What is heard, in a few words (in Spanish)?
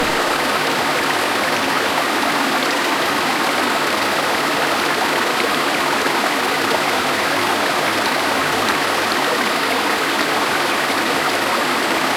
rio o arroyo corriendo, creek